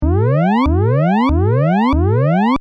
Electronic Alarm Loop; Alarms; Electronics; Stuart Duffield

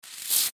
Spray Bottle Squirt Fluid; Spraying; Foley; Blastwave

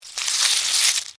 Insect Move Wet, Bug; Animal, Insect, Movement, Wet, Rip; Blast Wave

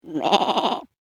Sheep - Animal Impersonation; Sheep Sound Effects

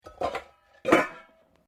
Bowl Metal Stacking; Various kitchen bowls; Metal (Sound Effects:Materials)

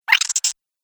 Cartoon Rodent; Cartoon Sound Design Sound Effects

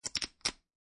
Poker Chips Stacking; Poker, gambling, chip: Stacking poker chips.; Card Sound Effects; Casinos; Lynne Music

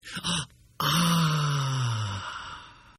Gasp

